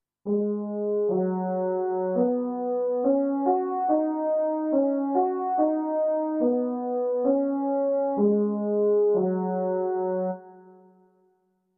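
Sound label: French Horn, digital piano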